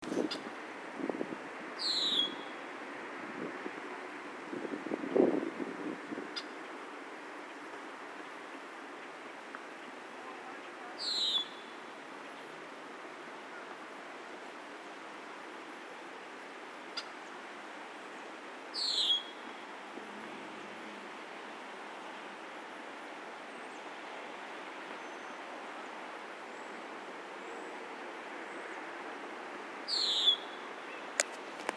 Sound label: Red winged blackbird